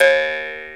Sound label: Jaw Harp Eh; Planet Earth; Digital Sound Factory Planet Earth